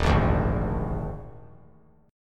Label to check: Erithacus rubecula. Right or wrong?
Wrong